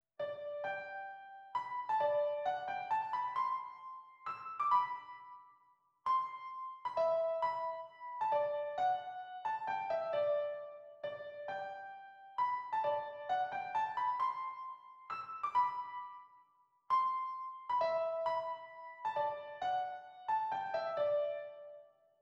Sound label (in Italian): tempo di menuetto